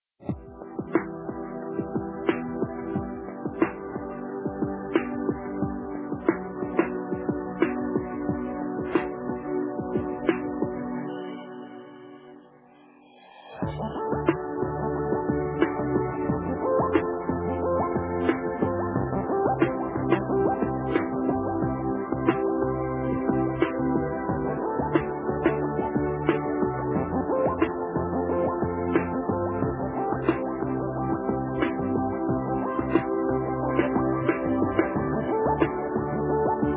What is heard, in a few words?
queue dreamtraveller 134